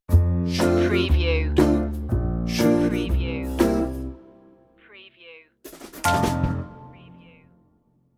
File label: fresh and fun stinger